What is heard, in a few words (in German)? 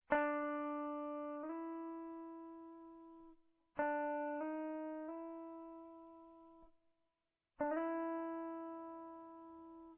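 slide up, Gitarrensounds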